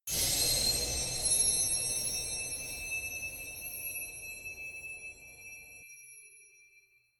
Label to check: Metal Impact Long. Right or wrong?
Right